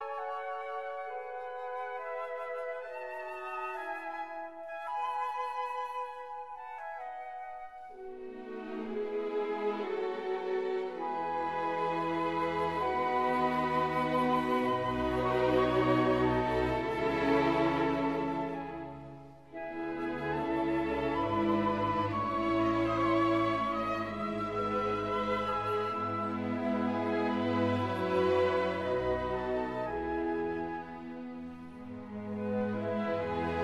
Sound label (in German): orchestereinleitung; die schoepfung; hob xxi2